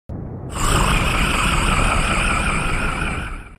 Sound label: scariest growl